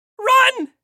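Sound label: Run mujskoi, male; Смешные и комичные звуковые эффекты для детских видео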